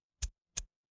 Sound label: ui click